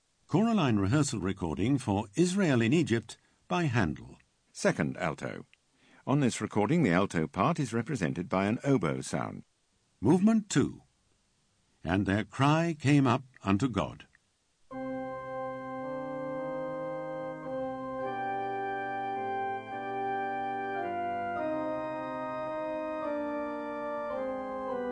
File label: Alto 2; Rehearsal; Handel - Israel in Egypt; ChoraLine